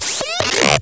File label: chatty creature